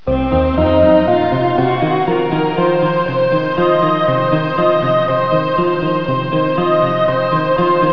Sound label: Dreamy; Symbolic Composer; S-COM Music Samples